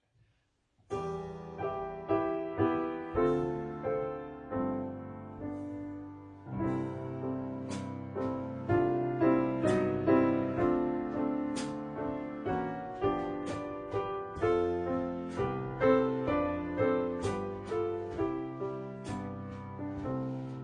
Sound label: piano drums